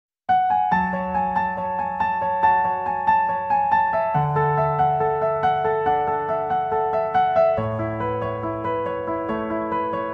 love piano

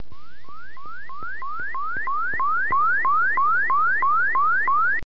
Zetron tone alert

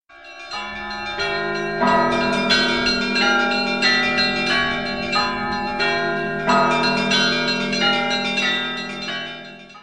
Bells; of Hilandar

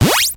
nes; punchout

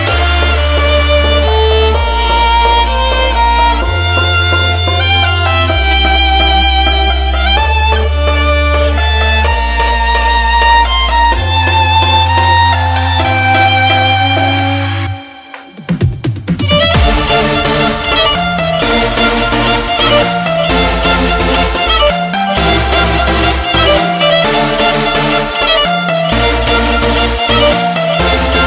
Violin

